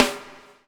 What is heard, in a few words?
BUBL, BUBBLE; Spectrasonics - Backbeat